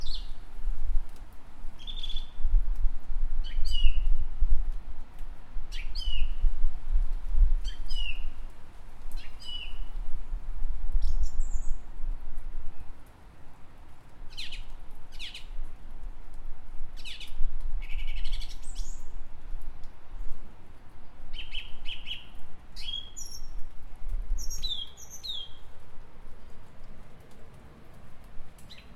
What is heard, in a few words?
january garden thrush